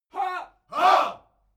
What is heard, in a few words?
military shout ha